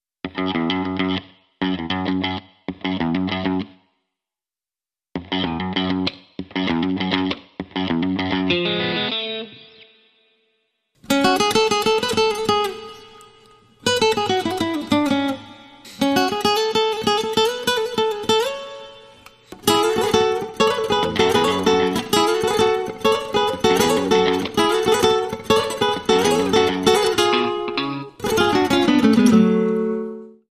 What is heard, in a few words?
Anemos, Guitar Only